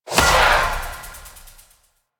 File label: dash start; Warframe; Infested; Zealoid Prelate